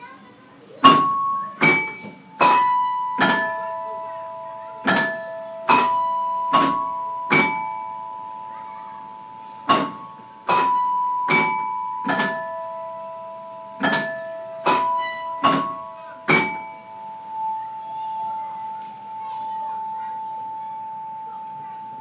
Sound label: bells at Barcelona zoo